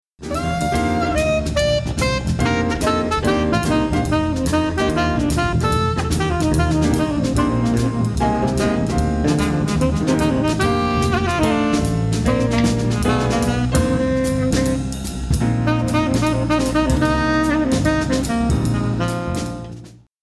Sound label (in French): extrait blues